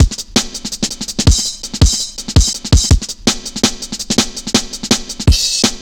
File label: Drum Bass, Drumloops, 165bpm